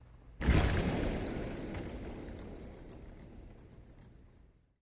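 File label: Hockey Body Hit Reverb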